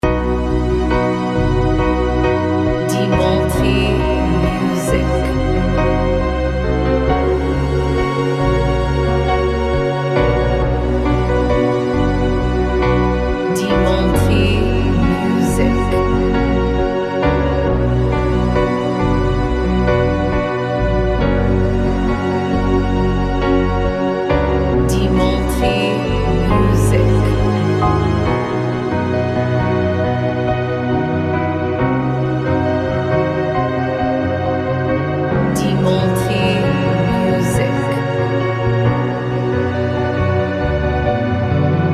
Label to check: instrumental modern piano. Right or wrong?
Right